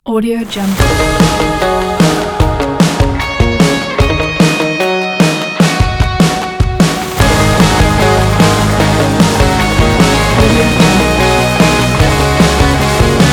indie pop rock

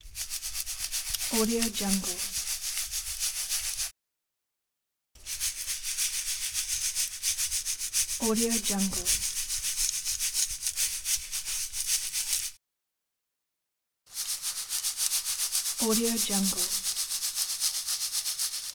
sifting

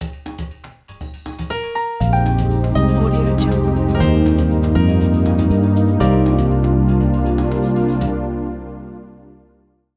romantic vibes logo opener ident; paichuu

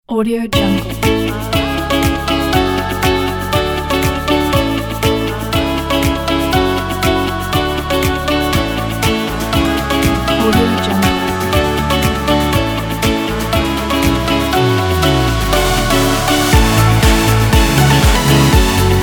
happy music

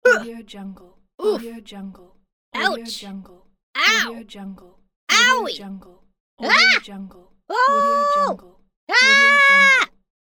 cute cartoon character gets hurt

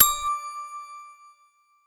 alert good positive ringing ding; empire game settlement

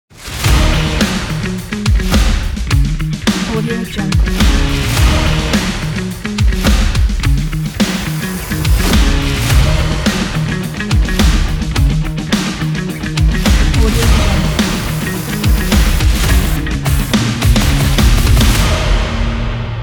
sport action ident

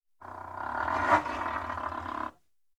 processed hyena backwards growling

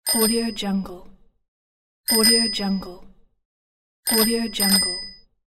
bicycle bell

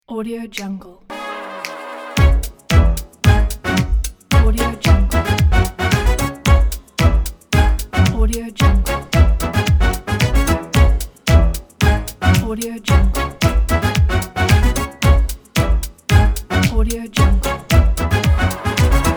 big band jazz intro

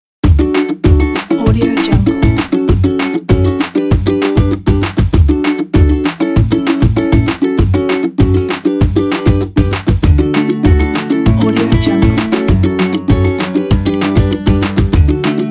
the happy

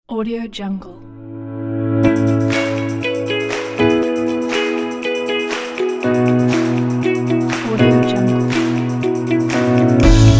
logo intro corporate

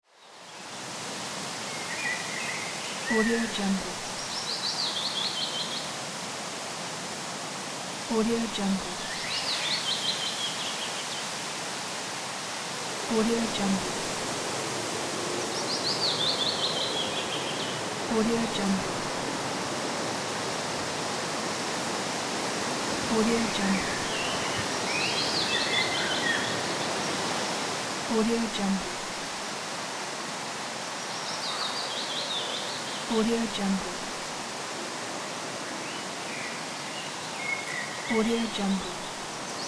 forest sounds

